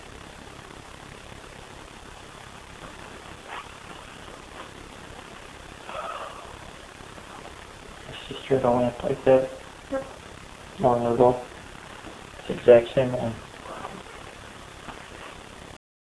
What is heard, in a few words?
Cackle; Marathon City Home